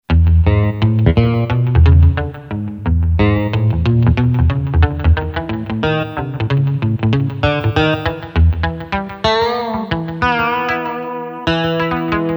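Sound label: Cool! Electric Guitar; Yamaha PSR-550; Voice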